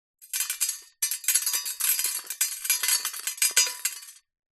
foley debris small fall onto metal

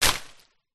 foley bush small dense hit with wood post

